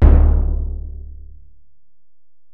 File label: Bell, Alesis DM-PRO